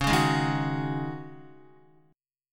C sharp Suspended 2nd Suspended 4th, C sharp, Guitar; strummed; JGuitar